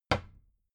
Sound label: cabinet door close regular